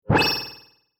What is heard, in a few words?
Future Telemetry Low Glassy Computer Beep Impact Interface Delayed Space; Sound Design